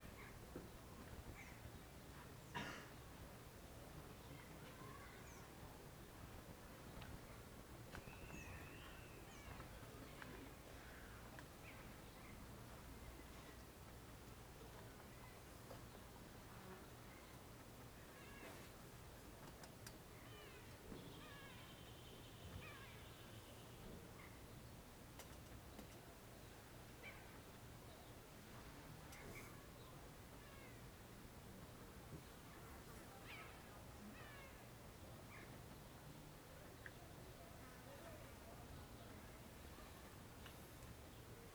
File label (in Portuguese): Ambiencia bar vazio a tarde com cadeira estalando, passaro, mosca; passa um carro